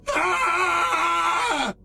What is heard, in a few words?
bacon scream